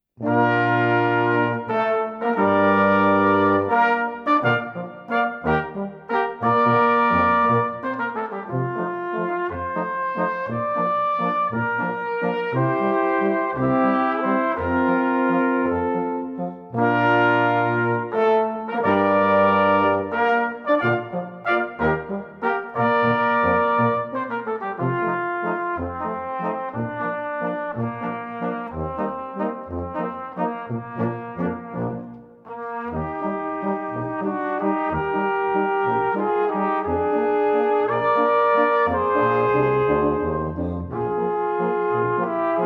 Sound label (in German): Touristen Walzer